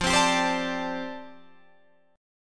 F 6th Add 9th F down Guitar Drop D, chord

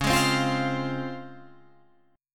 Db Major 7th Db down Guitar Open F; strummed

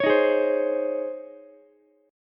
C sharp Major 7th Suspended 2nd, Ukulele; C#M7sus2 strummed; JGuitar

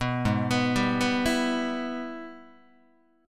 Minor Major 7th Sharp 5th, Guitar; G#mM7#5; arpeggiated; 2,4,2,5,3,3; 4A,4E,5A,5D,5G#,6C#

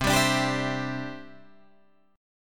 C Major Add 9th, Guitar Drop D

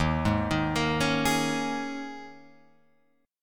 D sharp 7th Suspended 4th, Guitar, Open F; D#7sus4 arpeggiated; JGuitar

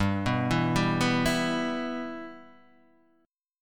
B Suspended 4th Sharp 5th G

